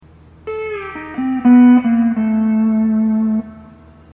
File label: hawaiian piece